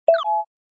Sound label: click